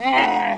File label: moo; giants